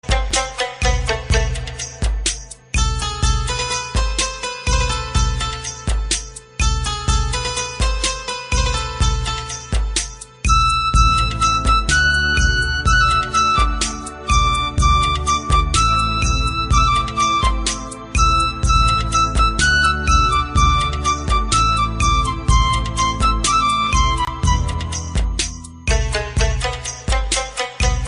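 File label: instrumental, bollywood; khalnayak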